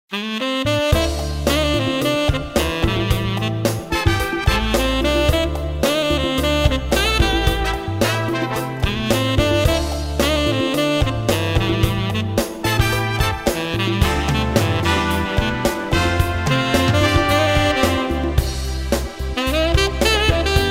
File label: Jazz funk